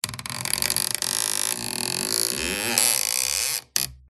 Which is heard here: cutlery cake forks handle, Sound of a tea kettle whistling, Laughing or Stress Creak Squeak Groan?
Stress Creak Squeak Groan